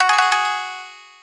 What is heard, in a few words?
gayageum, keytone